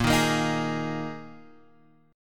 A sharp 7th Suspended 4th A sharp; strummed; JGuitar

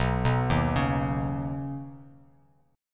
B Major Add 9th B Bass Standard; Badd9; arpeggiated; 7,2,1,6

